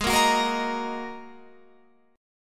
G sharp 7th Suspended 2nd G sharp, down, Guitar, Open F; strummed; G#7sus2